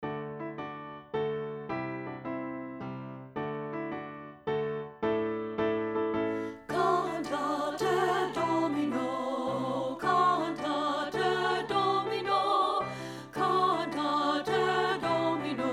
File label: ALTO; CANTATE DOMINO